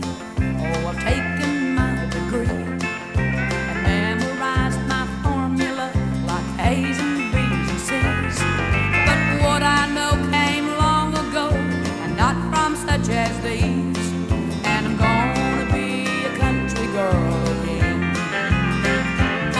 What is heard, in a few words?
country